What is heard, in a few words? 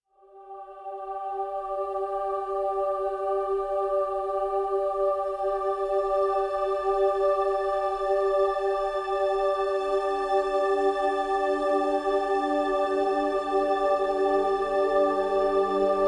Girl Boy String; Brand X Music; Brand X Music Catalogue - Editor's Essentials